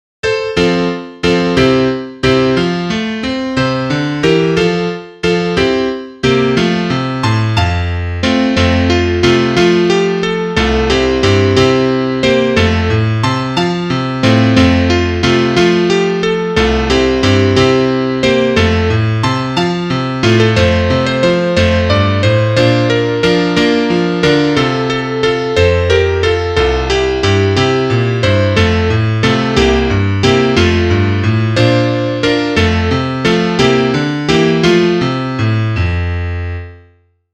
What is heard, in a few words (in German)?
Klavier; es klappert die muehle